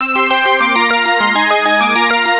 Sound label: Jingle Communication; Gotouchi Kentei DS